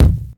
natural kick drum sound c key, kick drum sound tuned to the C note